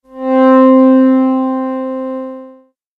Triton, synth lead

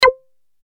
Strings hit

